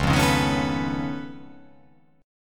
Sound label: C sharp Augmented 9th C sharp down, chord